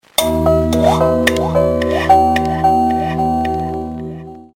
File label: Melody message